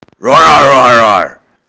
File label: roar arab